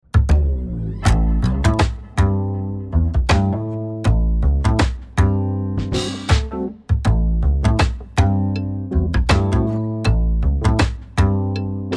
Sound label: Upright Bass